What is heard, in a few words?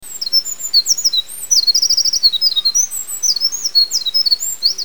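wren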